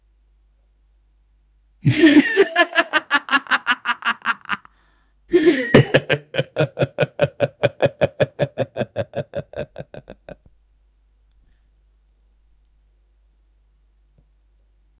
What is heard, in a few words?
sarcastic laugh; laughter